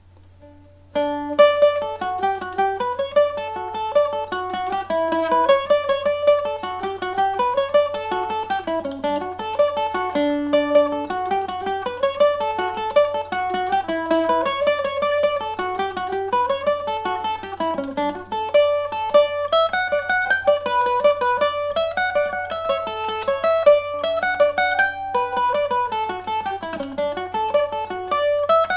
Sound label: benedicts rambles, mandolin